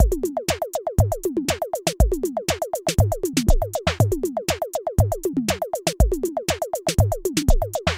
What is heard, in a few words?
Electro; Temple Dojo E